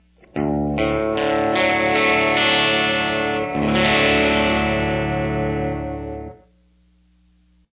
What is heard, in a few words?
open d